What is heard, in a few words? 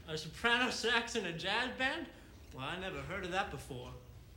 a soprano sax in a jazz band; Indiana Jones